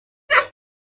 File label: Monkey Spider Chirp S